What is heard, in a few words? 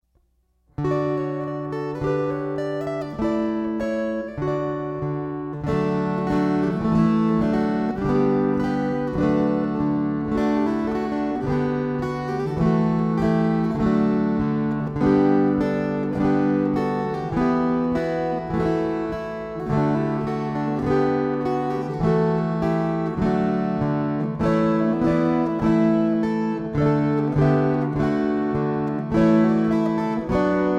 String Choir